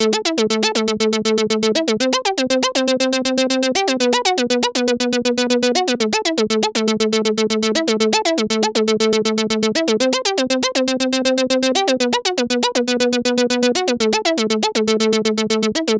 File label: Arp; enna telge